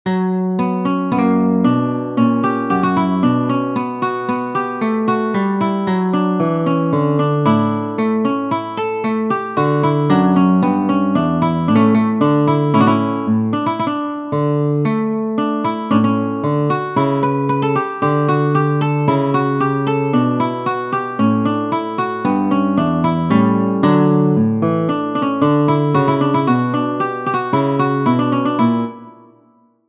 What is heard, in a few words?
Sonata Gavotte, guitar; Weiss